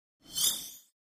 Machine Turn On Bee, buttons & beeps

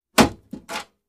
Metal Mailbox Door Close With Creak

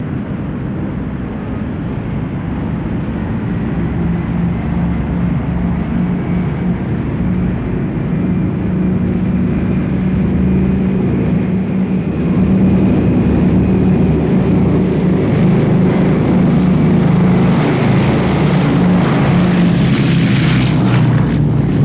B-29 Take Off, Best Power In Launching, Long

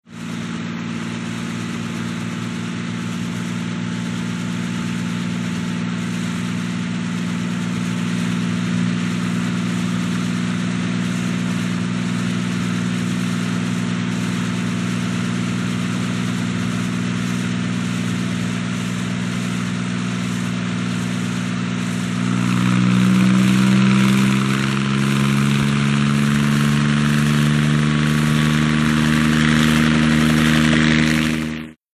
Heli Traffic Hover By, traffic

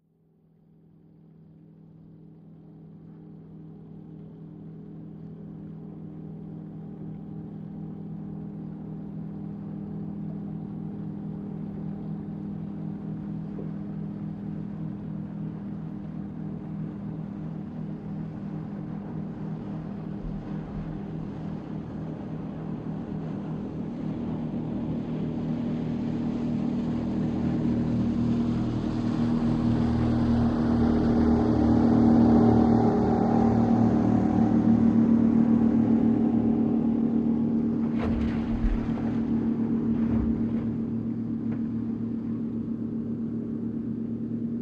Military PBR Offshor

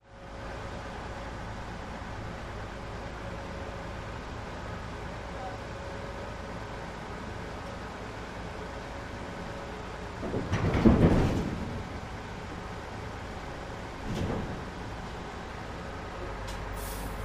port unloading; ambiences

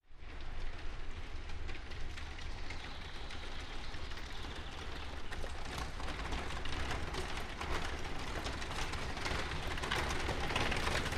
Carriage By Fast

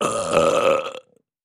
Med Rich Belch

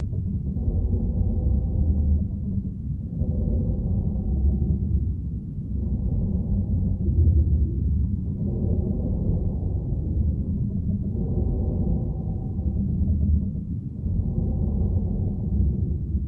Bird Prey Pooltimer, spaceship fx

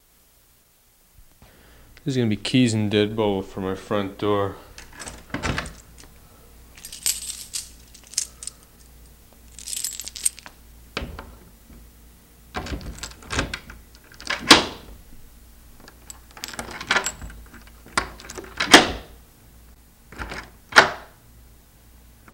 Dead Bolt Unlock with Key Movemen; Dead Bolt with Key Movement

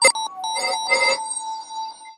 Button Press Confirm S

